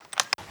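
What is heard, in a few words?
weapon foley fn2000 reload lift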